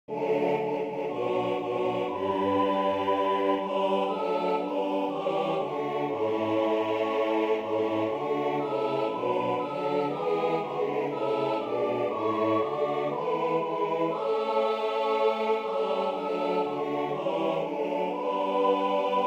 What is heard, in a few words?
soprano; Crown Him